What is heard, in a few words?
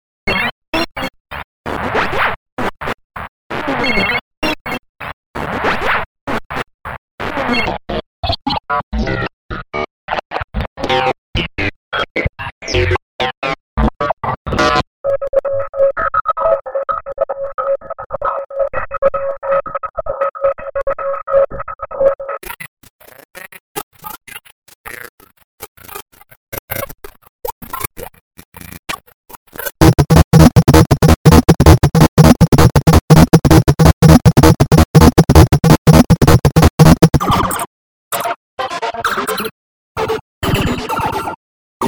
Circuit Bent Loops